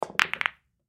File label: Pool Shot Shoot; Pool Shot Shoot Ball Cluster; Sports